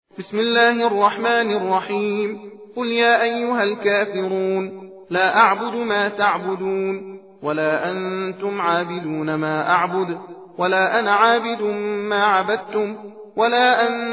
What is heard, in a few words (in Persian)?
tahdir kaferon, تندخوانی; سوره کافرون